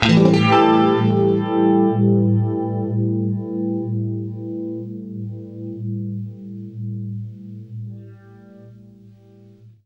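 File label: univibe Am7 left, guitar; East West; Steve Stevens Guitar Samples Collection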